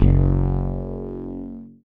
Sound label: STRANGE BASS; Masterbits; Dance Trax